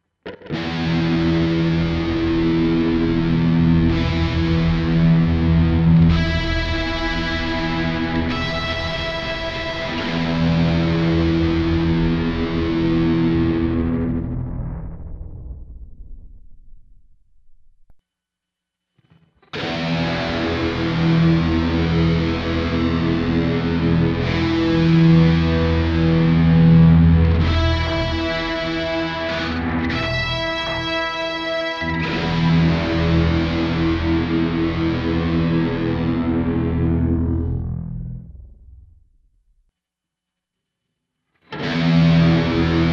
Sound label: scary clise axe enh